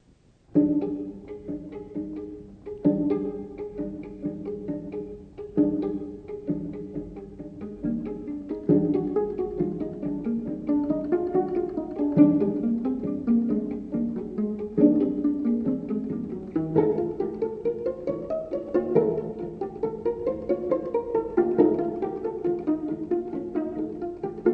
String Quartet, Allegretto pizzicato

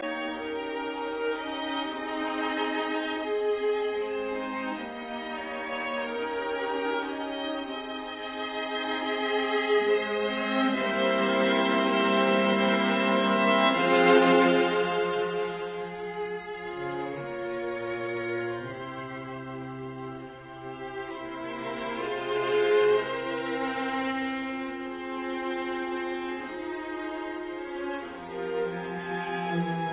String Quartet in F major Adagio molto e mesto; Beethoven; Op 59 No 1; Rasumovsky

